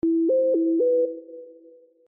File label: trap text tone electronica lovely sms tone ringtone